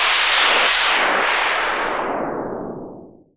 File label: FX Sweep Down; Vengeance Samples